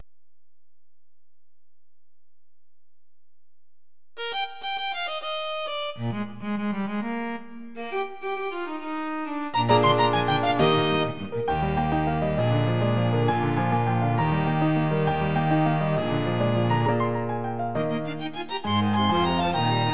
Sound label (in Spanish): Himno de Riego Piano